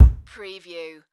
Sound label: Wall Hit Solid; Sound Effects Bible